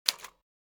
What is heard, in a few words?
typewriter type; Golden Crayon Sounds